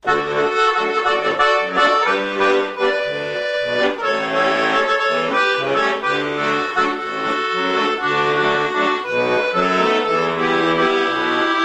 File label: Accordeon